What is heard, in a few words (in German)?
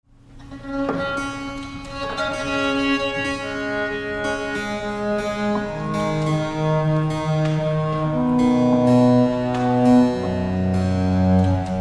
Gambe wird gestimmt 7 Saiten; Josephine van Lier